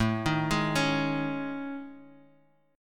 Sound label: A Major 7th Flat 5th, Guitar, Drop D; chord